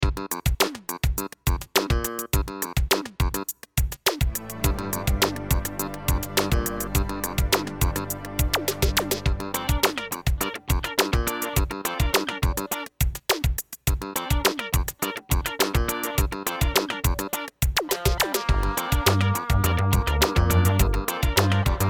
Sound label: Disco Fill